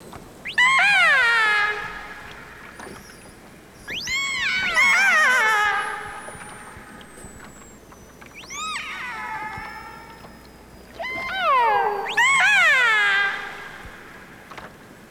killer transient pod, dolphins

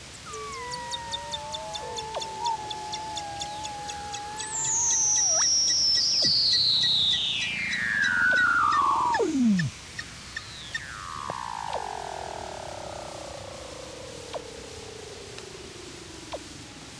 weddell Seal, pinnipeds

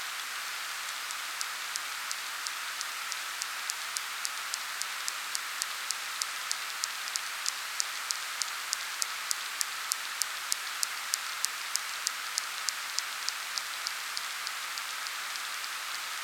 pacific white sided, pacific white sided dolphin; dolphins

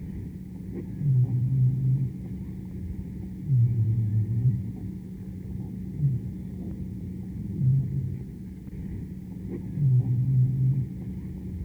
Bryde's whale; baleen whales

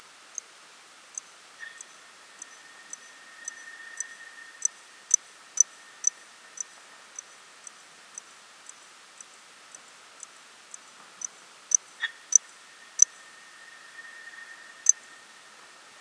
dalls porpoise; porpoises

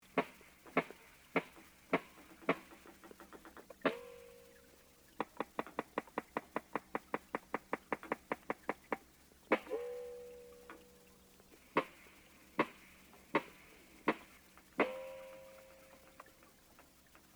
Pacific Walrus, pinnipeds; ringtone

